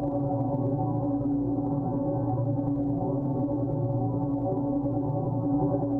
Tonal Re Draw, sparse soundscape

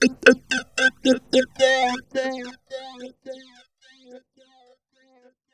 BEAT FX, Funky Vocals

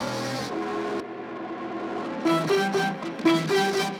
120 E Dry, MELO + FILTER; Virus; RoBKTA Sample Pack Supreme

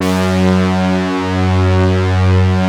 BRASS, PADS; Masterbits; Vintage Voltage

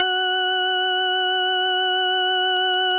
F#4, organs; Music Store Sound Service; Einstein

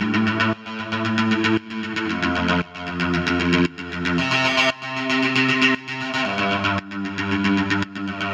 115 A Gtr Modern Run; Zero-G; Future Wave

